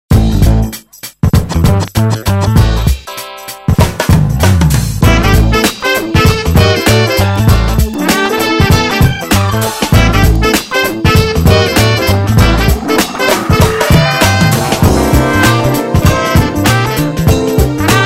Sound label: Funk; London Racer; PSX